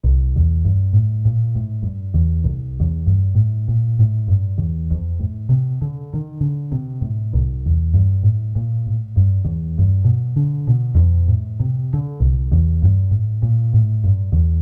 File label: bass; Matt Hoffman's Pro BMX 2; XBOX